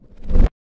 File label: reverse effects